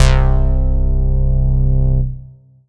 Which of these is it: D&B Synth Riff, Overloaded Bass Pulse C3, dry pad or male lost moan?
Overloaded Bass Pulse C3